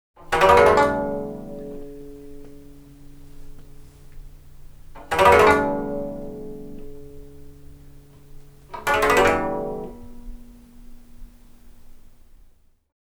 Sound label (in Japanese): wagon